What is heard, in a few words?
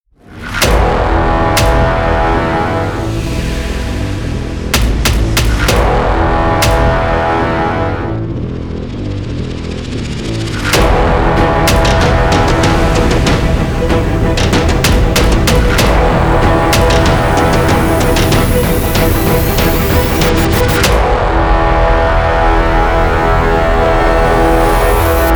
Hybrid Action Trailer; This powerful aggressive and epic hybrid trailer is perfect for movies and action videos.